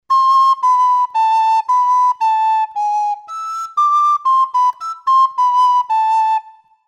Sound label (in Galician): trad, Pito Galego